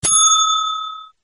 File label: Hotel bell sound